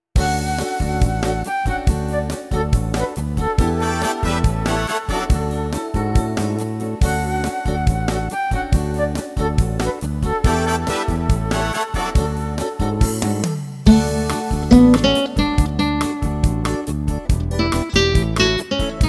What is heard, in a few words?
Xmas Bossa